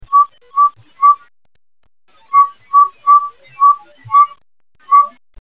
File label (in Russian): ceplnaya pila skripuchii zvonkii blizkii